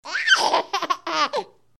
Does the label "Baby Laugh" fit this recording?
Yes